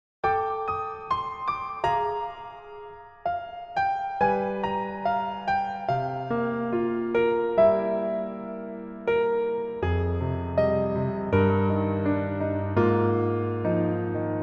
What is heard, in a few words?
Piano MR